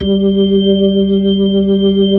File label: B-3 Chorus